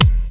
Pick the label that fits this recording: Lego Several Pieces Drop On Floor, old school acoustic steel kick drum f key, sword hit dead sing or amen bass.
old school acoustic steel kick drum f key